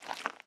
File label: slime walk; blocks; Minecraft; Remastered